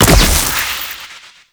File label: Treasure Box Proj Impact; Warframe; ManInTheWall